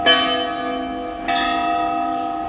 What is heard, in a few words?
tram; NFS High Stakes